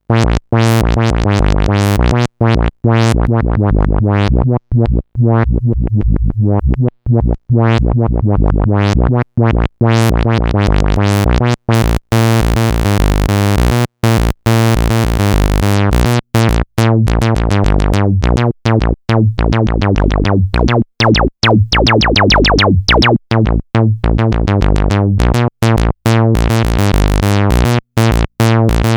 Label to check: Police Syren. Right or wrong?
Wrong